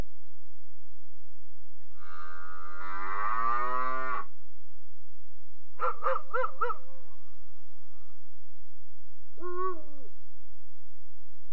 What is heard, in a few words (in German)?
stimmen, tiere